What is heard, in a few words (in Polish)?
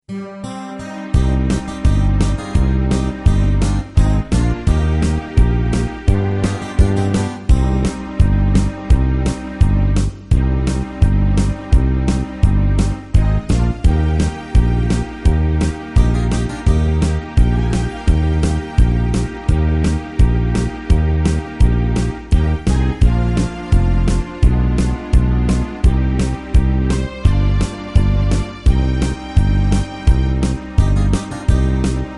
nie boj instr